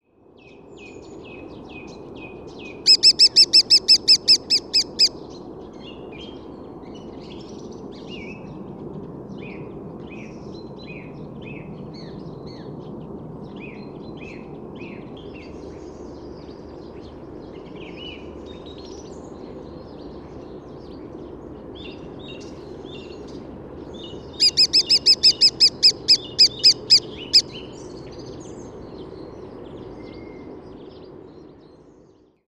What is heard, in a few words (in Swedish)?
Mindre hackspett